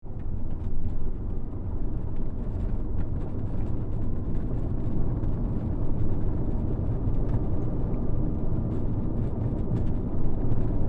Car, Hatchback, Int Ride, Bumpy Dirt Road, Accel-Decel; Cars; Big Room Sound